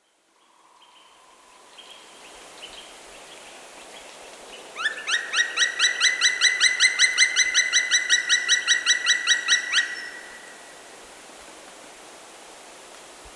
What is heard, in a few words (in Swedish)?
spillkråka; fågelljud